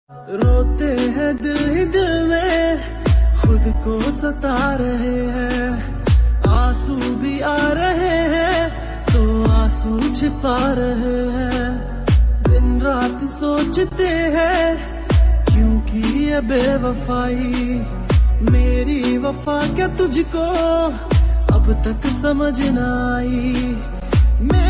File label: Ringtone, Sad Breakup